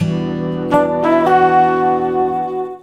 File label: airport logo; gru airport